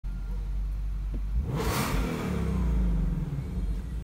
Ford Mustang Shelby GT500 Exhaust Sound Quiet Mode